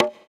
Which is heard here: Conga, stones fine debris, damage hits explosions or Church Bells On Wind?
Conga